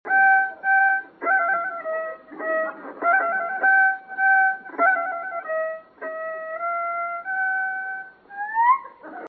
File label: Violin